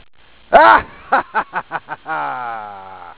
LAUGH

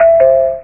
doorbell

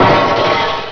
DISH CRAS

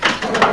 start; PROTEC

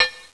TINK

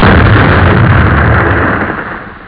explo

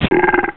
BELCH

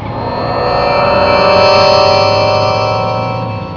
TREK BEAM

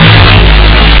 boom; amigaformat; ScreenPlay; GonyShoot

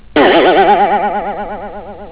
BOING

